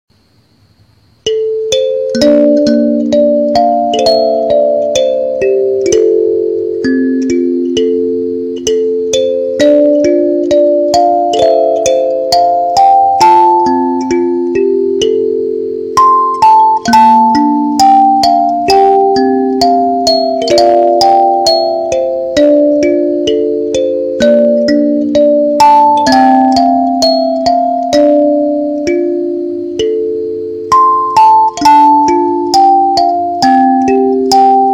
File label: kalimba